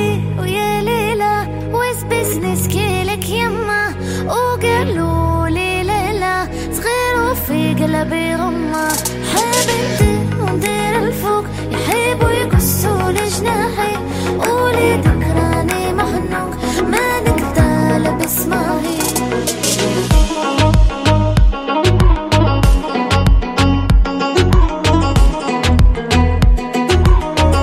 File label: Arabic Ringtones